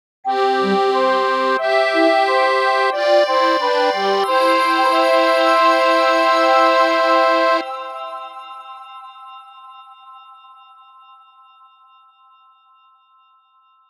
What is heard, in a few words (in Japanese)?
end ジングル